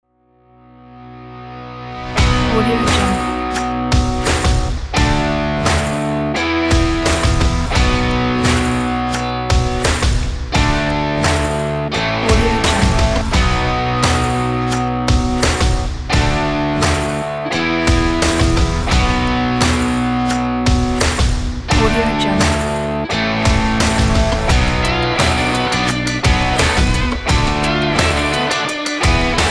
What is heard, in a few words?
contemporary stomp rock